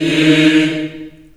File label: D#3, Choir